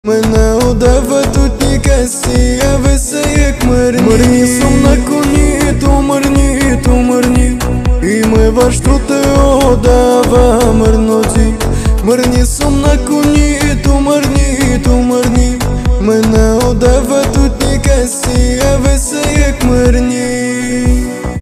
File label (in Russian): новая цыганская песня